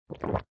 Tieng uong nuoc nuot thuc an

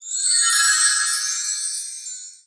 Am thanh lam phep thuat chua benh

